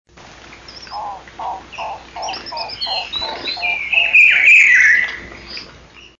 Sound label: dark billed cuckoo